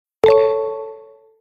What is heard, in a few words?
Msg Alert Email, notifications; WindCanada